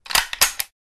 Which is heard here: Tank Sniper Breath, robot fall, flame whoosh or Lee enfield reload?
Lee enfield reload